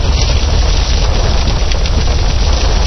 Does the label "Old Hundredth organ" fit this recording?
No